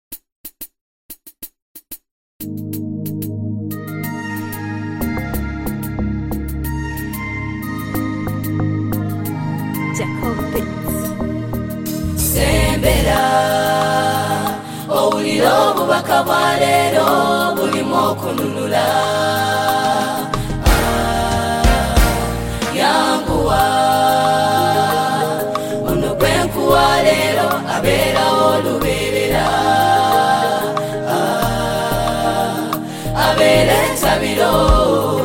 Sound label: Choir Performance; Stream Of Life Choir